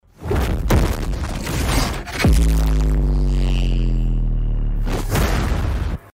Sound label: Boom; Fire Force